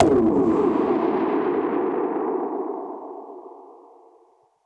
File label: Perc Hit, dub percussion